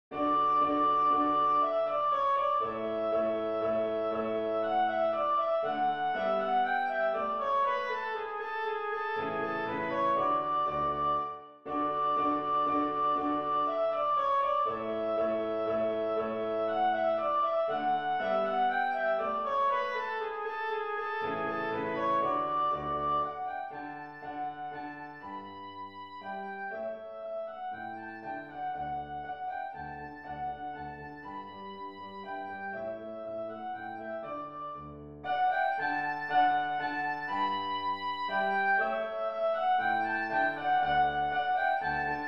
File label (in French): danse allemande, ré majeur; haydn joseph